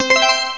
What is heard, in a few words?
combo